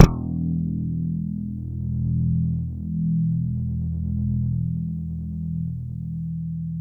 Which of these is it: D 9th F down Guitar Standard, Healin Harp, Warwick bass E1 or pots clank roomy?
Warwick bass E1